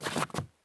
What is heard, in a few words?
stop wood; Escape from Tarkov